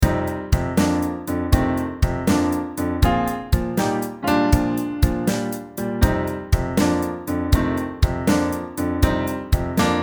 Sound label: Left Hand shuffle rock, piano